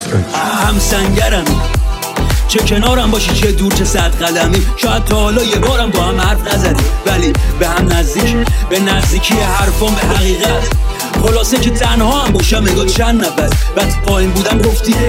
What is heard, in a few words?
Remix Rap